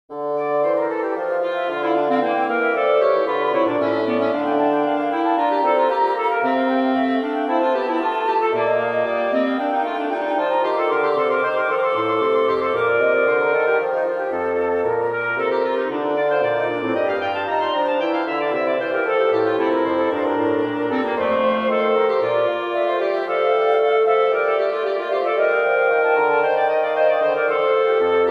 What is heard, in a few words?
Invention for Wind Trio